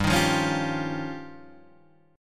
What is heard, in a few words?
F 7th Suspended 2nd Sharp 5th